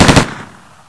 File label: smg1 3 rounds; hl2